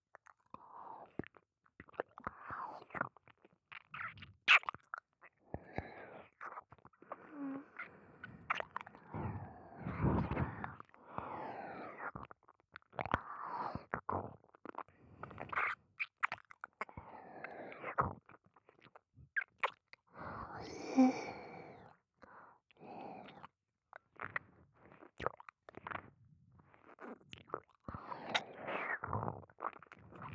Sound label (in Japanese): 耳舐め・普通